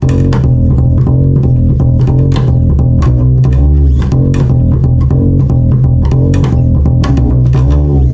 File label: aggressive upright bassline; BASS LOOPS